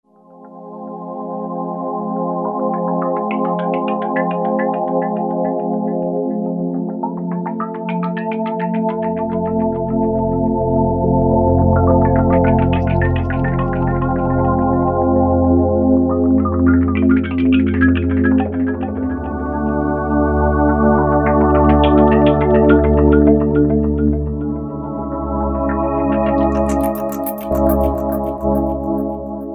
Flotation, Roland V-Synth

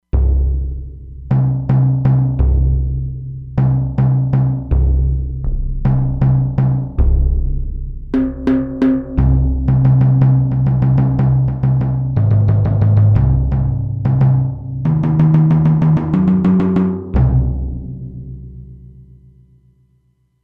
Timpani, E-mu Proteus-3; Factory patches

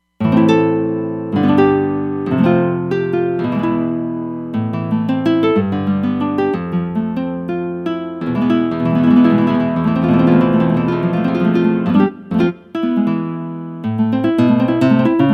Natural Nylon, Roland Fantom XR

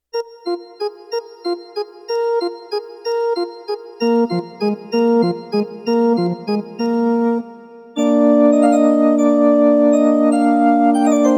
Qatsi Organ, Roland VC-1

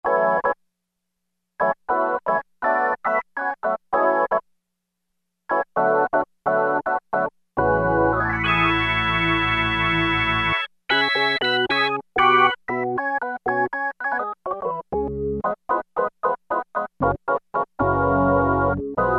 Rock Organ, Roland MKS-70

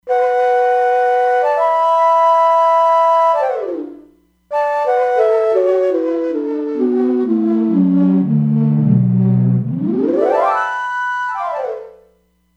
Fifths Ney, E-mu Proteus-3; Factory patches

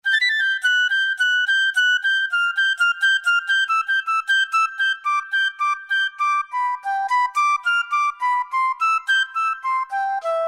Piccolo, Korg TR-Rack

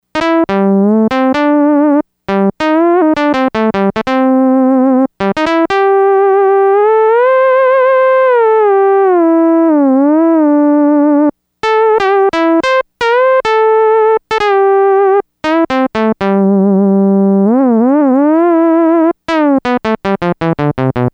Mono Lead, Yamaha DX100; Factory Patches

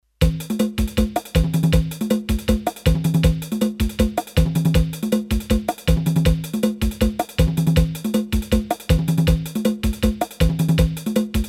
Merengue, Roland D-10, Rhythm; Rhythm Demos

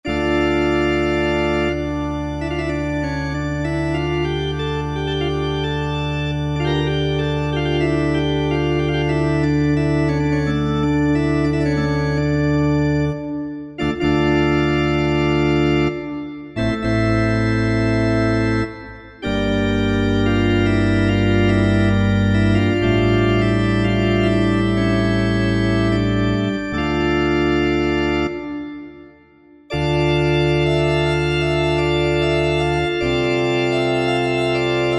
Or Cathedral; Yamaha EX5R; Factory presets

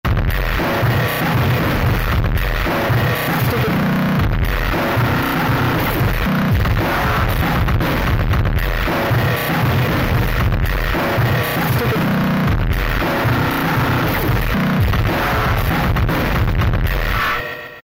Hardcore Break Beat; Korg ES-1; Factory Patterns

